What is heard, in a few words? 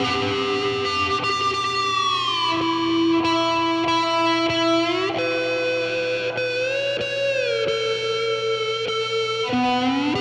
BD Effect Guitar